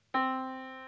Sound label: yamaha Disk